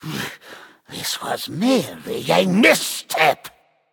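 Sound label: non-verbal boohoo; smite; voicepack; jingwei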